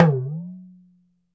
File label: Talking Drum down up; E-MU Producer Series Vol. 7 – Old World Instruments